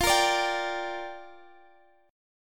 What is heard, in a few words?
G Minor F, chord; Gm/F; strummed; JGuitar